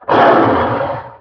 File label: Tiger Roar; Playable Tutorial Level; TR2